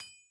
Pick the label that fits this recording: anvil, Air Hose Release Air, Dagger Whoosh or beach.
anvil